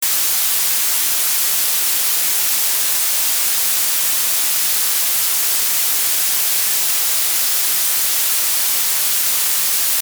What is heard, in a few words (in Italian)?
esempio rumore blu; Elettronica